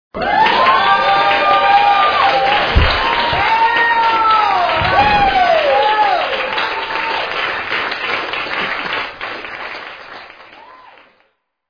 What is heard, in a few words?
Applause